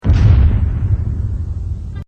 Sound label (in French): OBUS; MOBICLIC162